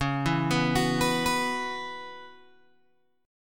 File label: Db Minor 11th Db Guitar Open G